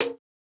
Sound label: Hihat, Dubstep